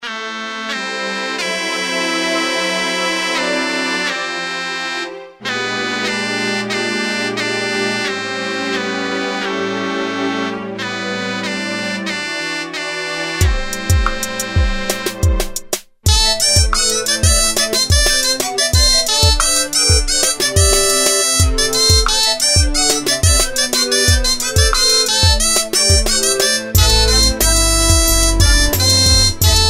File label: Jaleika, Asian Ethnic Sounds